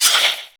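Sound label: dwarf axe weapon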